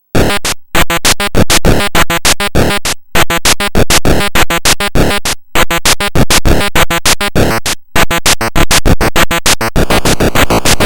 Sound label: SYNTHCART PITFALL NOISE; PAUL SLOCUM